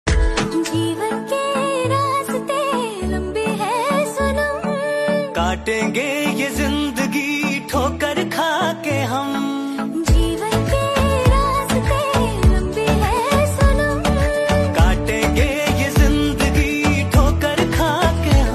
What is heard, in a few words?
Ringtone